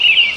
korotkij zvuk svista drozda

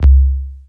knopka suhaya odinochnii blizkii priglushennii

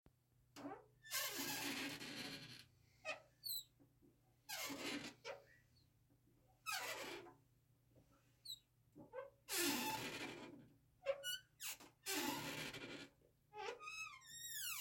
skrip dveri moej vannoj

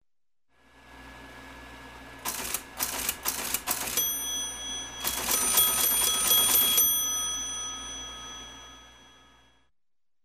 teletajp pechatanie s pererivami

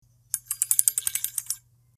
zvuk kodovogo zamka bankovskogo hranilisha

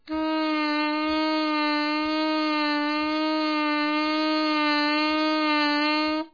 saksofon odinochnij dolgij nudnij

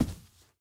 redkij korotkij gluhoj vistrel salyuta

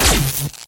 metkij kosmicheskij vistrel

